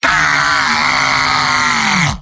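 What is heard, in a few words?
heavy pain critical death; vo; tf2; mvm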